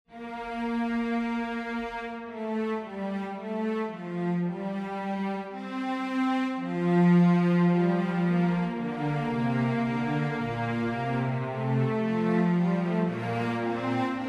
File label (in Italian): Fuga Sib; Harmonie Beethoven; Esempio 18